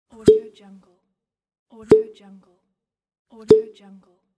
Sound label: wine cork opening